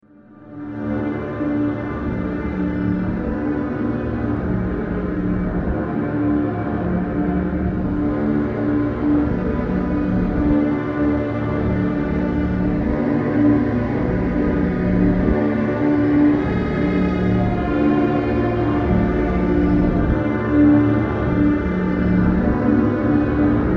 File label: Orchestral Drone